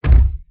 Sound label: Bumm